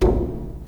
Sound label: DRUM R, ASIAN PERCS; Crypton - DrumBase5000